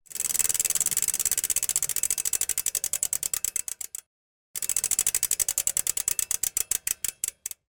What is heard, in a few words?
Bicycles, Wheels, Chains, Crankset, Freewheel; lilesound